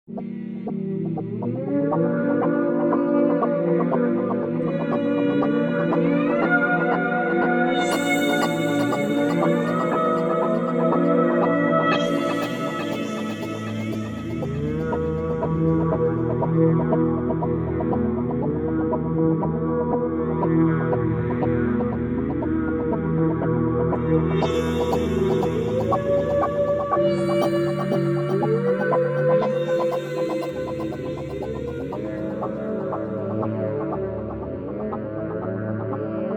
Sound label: mellotronic